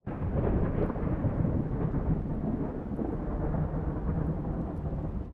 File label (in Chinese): 闷雷声